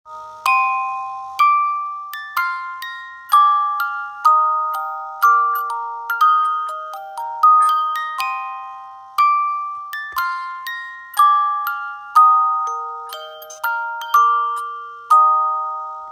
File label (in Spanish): Marcha Boda Mendelssohn